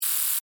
Alien Insect Move, Scifi, Alien, Insect, Movement, Shake, Rattle, Buzz, Science, Fiction; SoundEffects-BlastWave